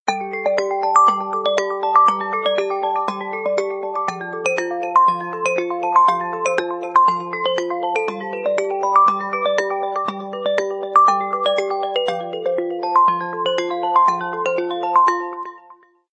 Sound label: Galaxy Chat Tone